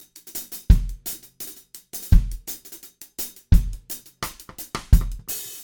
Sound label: 170 reggae